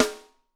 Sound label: SD, Drums; Bob Clearmountain Drums I